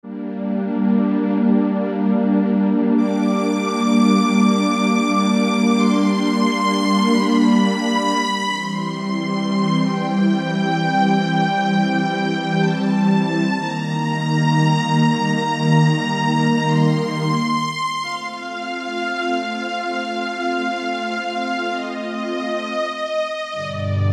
Strings Pad

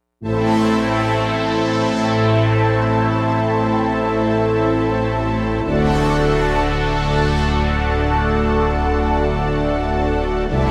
Big Mouth Pad

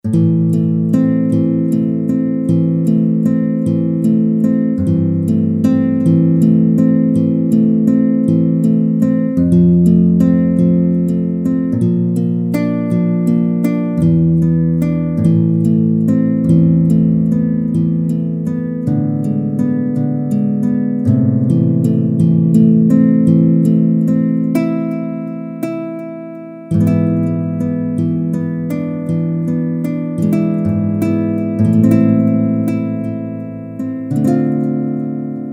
ENH. NYLON, Roland SC-880; Factory Patches

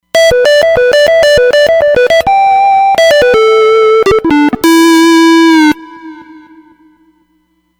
Solo Saber, Roland Fantom XR

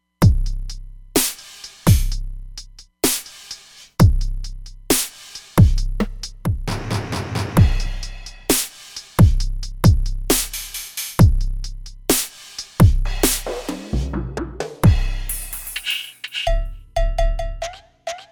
Passion Drums

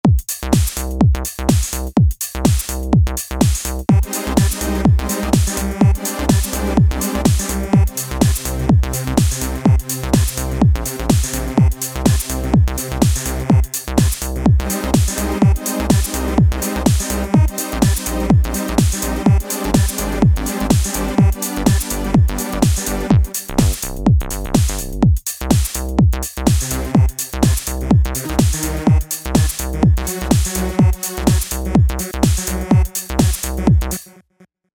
Electro Tek, synth

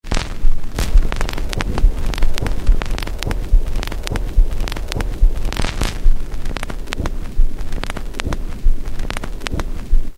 Record Noize; Roland M-DC1

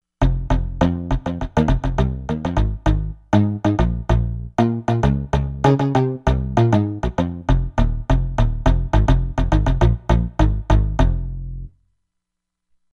EnvBass; Environment

